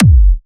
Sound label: Kick